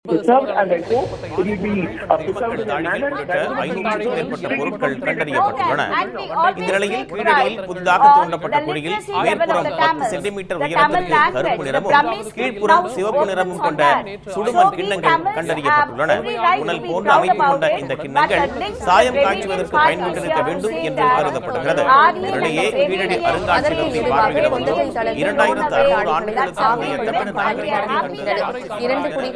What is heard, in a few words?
news cycle soundscape; keeladi